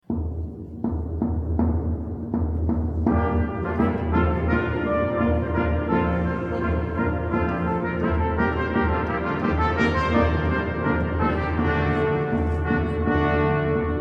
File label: Pavane, brass percussion; Phalese; schnifis